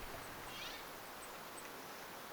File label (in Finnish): jarripeippo